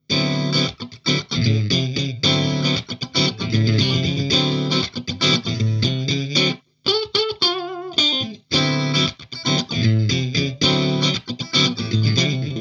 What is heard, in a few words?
blues strat